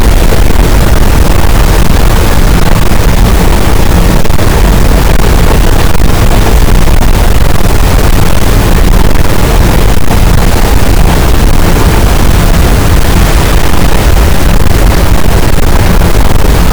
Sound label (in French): cachalot, gaussian noise; Physeter Macrocephalus